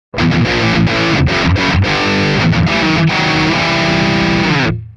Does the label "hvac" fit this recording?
No